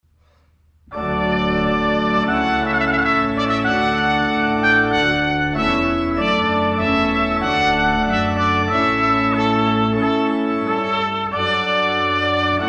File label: trumpet voluntary